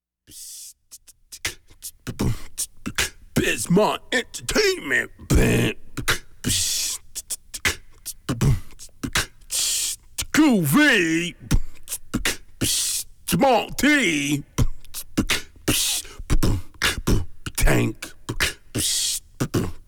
beatbox interlude

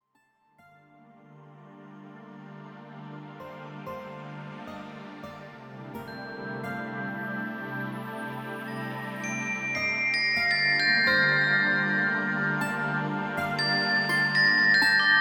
pure relax bells and guitar

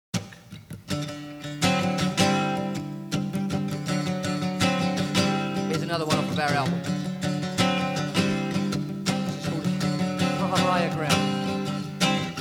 acoustic version

